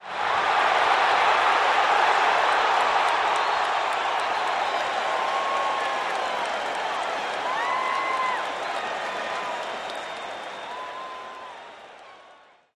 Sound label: CROWD CHEER